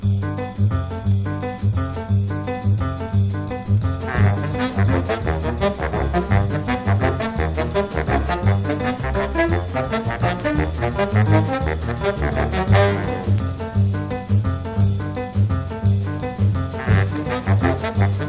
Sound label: jazz waltz